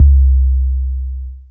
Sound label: Sub Hit, Bass; Sonic Foundry (Sony Creative Software); Underground UK House & Electro